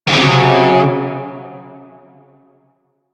Shawzin Hirajoshi Chord; Warframe; Narmer Shawzin

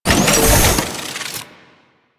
Turret Tether Deploy; Warframe; Zariman

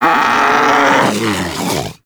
Predator Vocal Death; Warframe; Hunting Critters

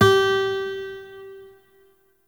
Shawzin Oct Two G; Warframe; Lotus Shawzin

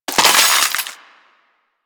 Loot Container Break; Sentient; Warframe; Ambience; Sentient Devourer

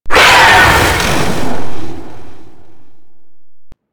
Golem Melee Head Attack Swing; Warframe

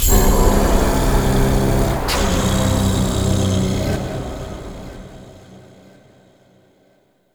Tablet Correct; Warframe; Duviri; Easter Eggs

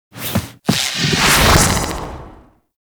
Bullet Jump; Operator Transference; Warframe; The New War; Throne Room

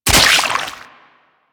Wyrm Bait Burst; Ambience; Warframe; Infested Eris

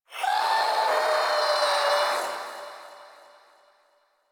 Shadow Vocal Idle; Warframe; Duviri

